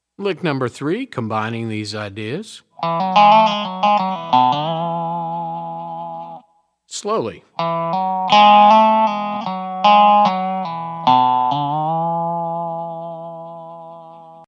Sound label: resonator pedal, steel guitar